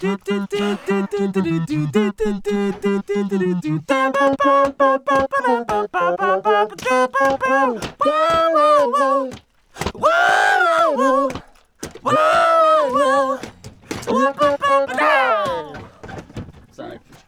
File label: stereo 3d